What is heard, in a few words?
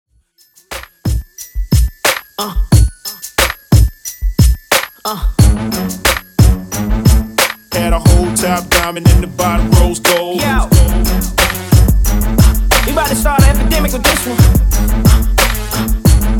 Extended 90BPm